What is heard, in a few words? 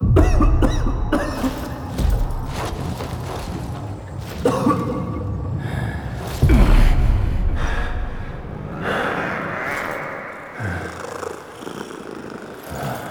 Tank Subway Transition; Warframe; NineteenNinetyNine; Cinematics